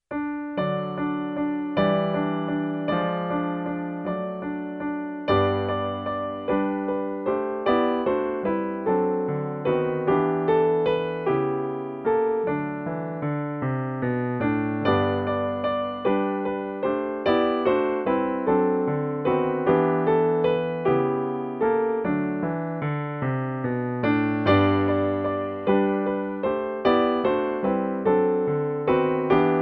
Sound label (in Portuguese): Bom dia PIANO